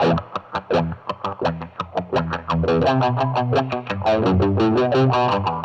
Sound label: Electric Guitar, Loops; Guitars